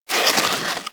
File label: Armadillo Roll Snow